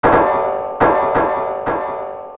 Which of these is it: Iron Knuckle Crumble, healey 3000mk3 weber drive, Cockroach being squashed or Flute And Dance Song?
Iron Knuckle Crumble